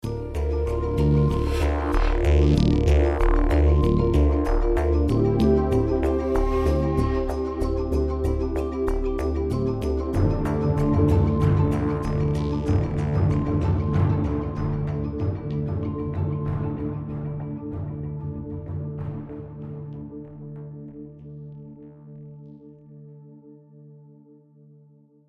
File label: map start background; Valorant; cstrike